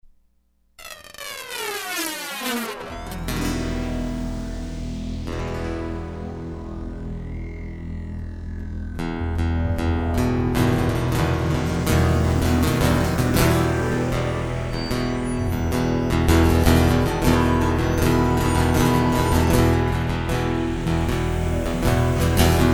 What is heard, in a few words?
Rollin Thunder Postlude; hyper terrestrial